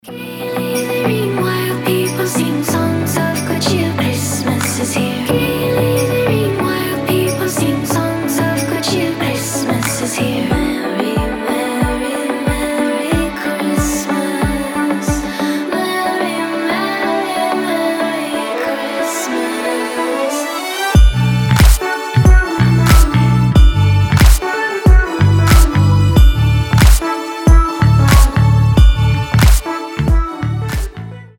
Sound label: rington christmas cheer